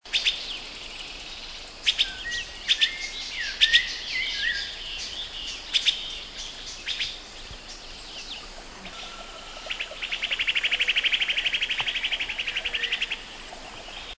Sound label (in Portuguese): canto do anumara